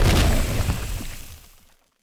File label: weapon volatile organs; Stellaris; grand archive; weapons